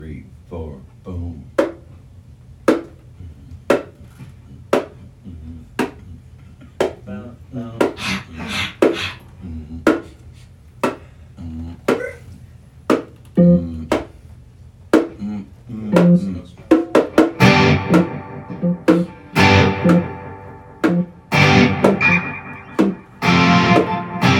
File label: sans drums bass Funky begining only